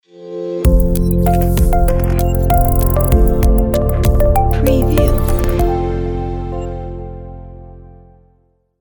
minimal corporate logo

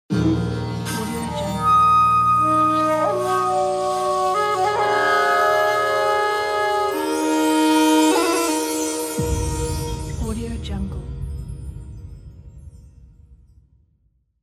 ramadan logo

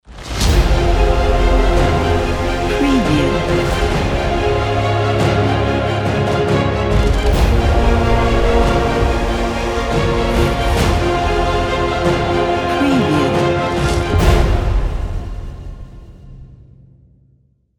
slow dark epic logo

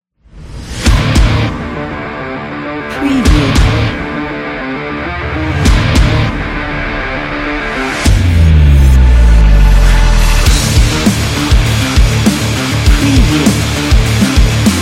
epic rock cinematic trailer

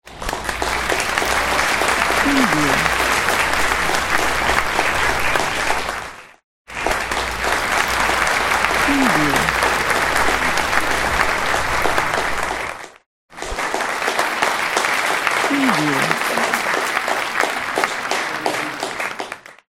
applause

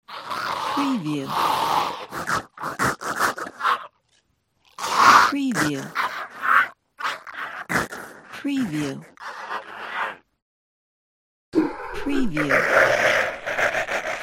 monster dies

